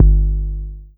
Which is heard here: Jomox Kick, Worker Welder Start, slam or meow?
Jomox Kick